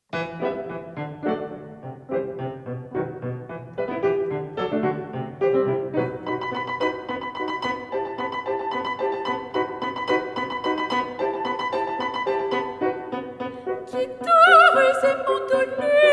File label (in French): guitares et mandolines